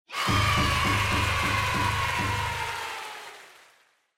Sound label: Cheer Yay, High Long Applause